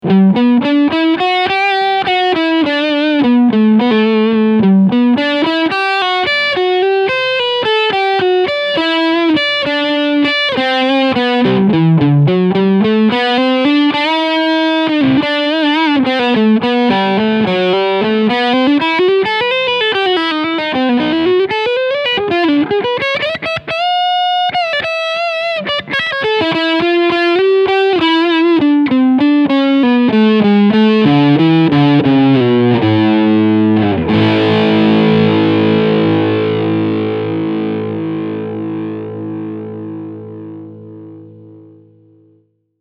Maybach Albatroz 65 Crunchsound Volumeregler FULL

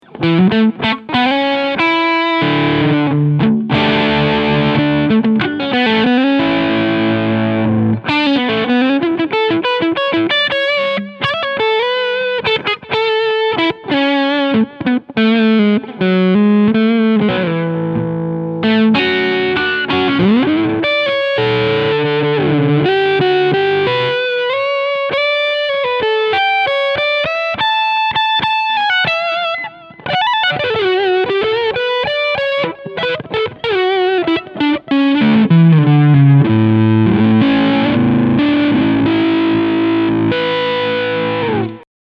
PRS Crunch Schalterposition 2

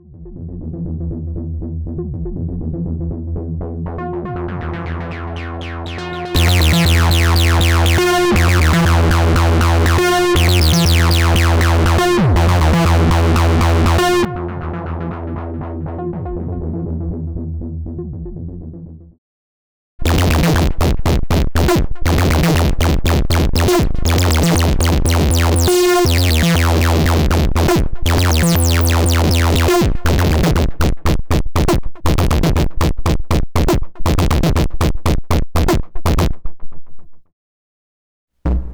Analogsynthesizer Acid Bassline Verzerrung; Erica Synths Syntrx II; mittels Scope. Varianten

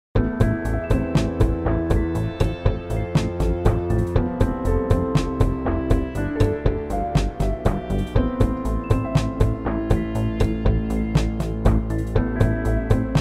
Deluge Sound Drive mit Gesang

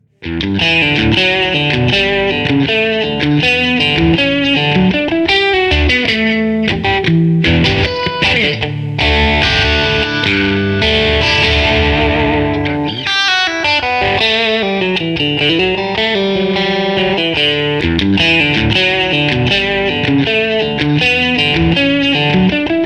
Hals und Stegpickup innere Spulen; Petrucci BFR

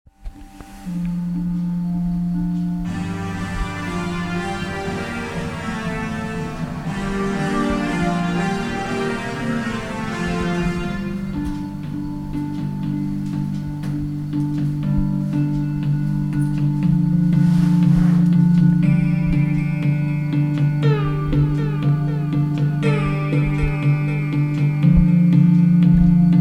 Gang zur Schallquelle

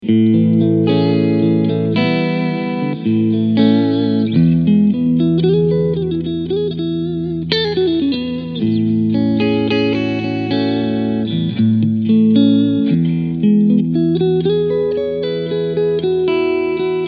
DV Mark DV Little FG 250 Gitarrenverstärker Cleansound

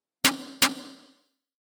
Snare bearbeitet zusaetzlich Punch Distort und Width

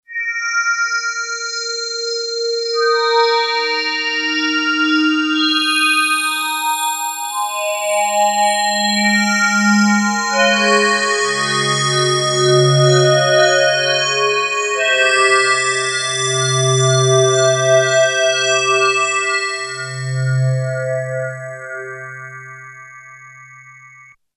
additiv

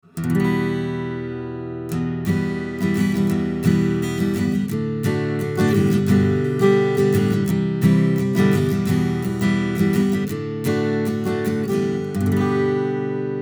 DPA 4060 Gitarre Kugel Schallloch Chords HPF150Hz12dB

